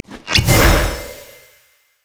drunken rage; LoL; gragas

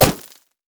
axe throw grab; olaf

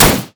basic attacks; Ziggs; poolparty

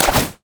minefield; LoL; ziggs poolparty

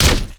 gangplank pistol; LoL; special forces gangplank

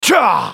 spellcast effort; JarvanIV

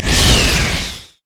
carpet bomb; rumble skin03

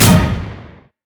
teleport moonlight; Diana

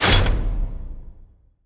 ui menu close; LoL; hud

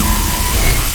death ray; LoL; viktor skin03

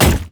basic attack; renekton

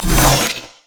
mystic shot; LoL; cyberezreal

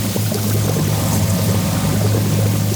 bioarcane barrage loop; LoL; kogmaw

